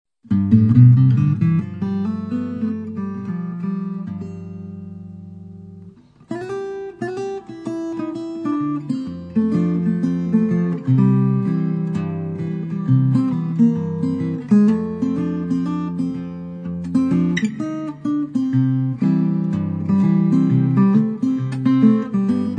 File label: Parlor Guitar